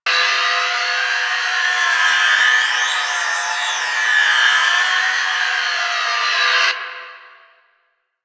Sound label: Weave Boss Spirit Two Loop; Warframe; Cephalon Weave